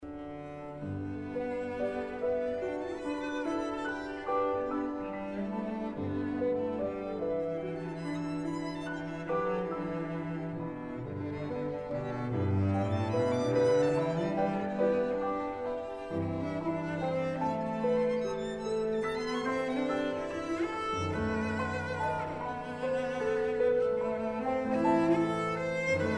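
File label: piano trio; cadman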